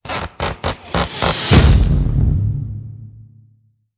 Balloon Friction; Say Cheese

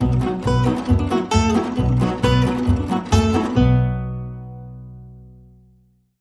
Fast Blues Swing

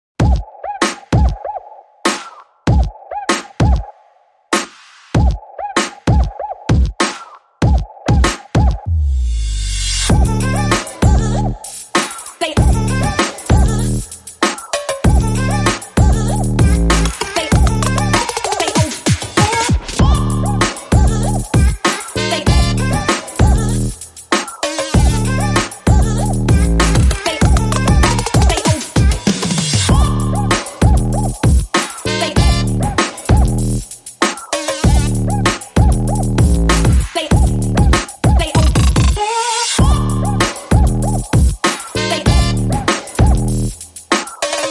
No Lead Synth

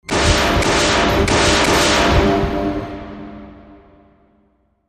Metallic Shots

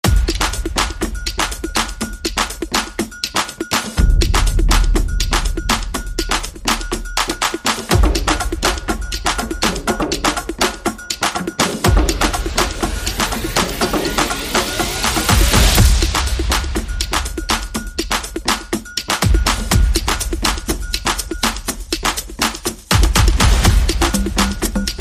No Horns

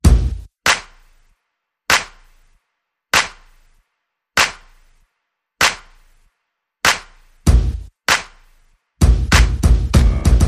Bass and Perc Only

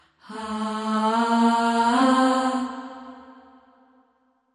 Choir

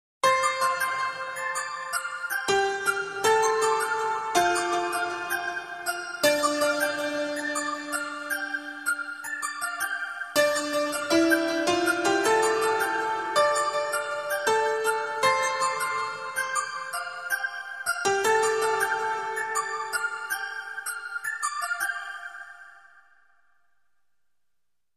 Alternate

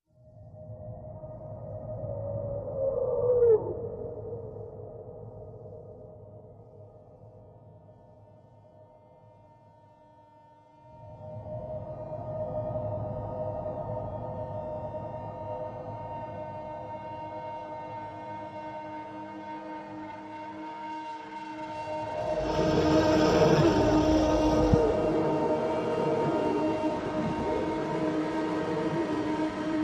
Vocals Pads and FX Only

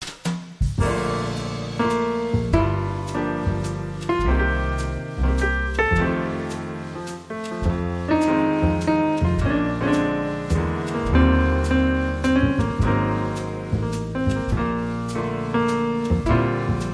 Twinkle Twinkle Little Star

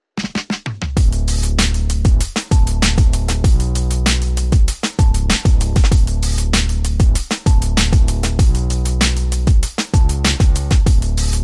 Drums and Bass Only

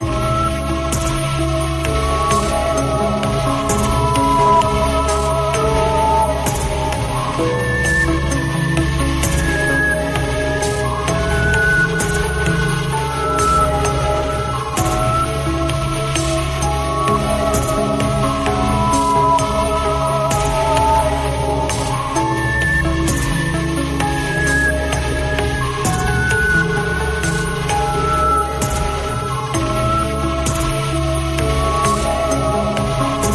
Distant Space

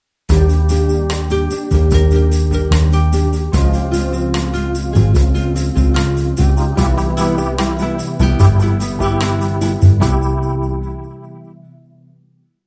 Dreamy Bossa, Sting

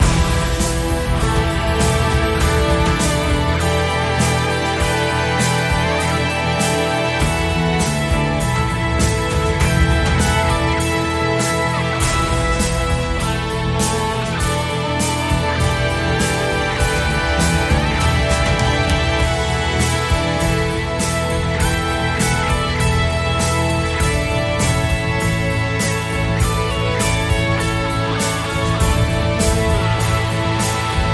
Hard Sympho Epic

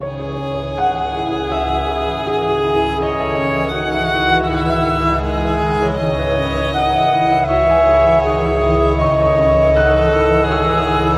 Melody With Clarinet & Violin; 30sec

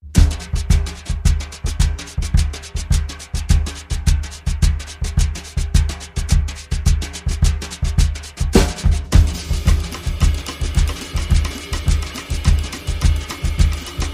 Drum Set Only

